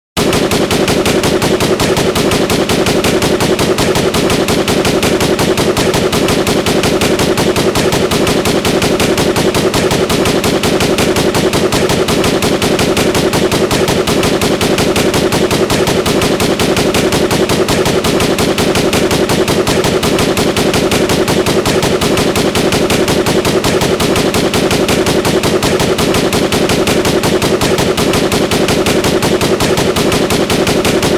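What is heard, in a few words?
burst gunfire sounds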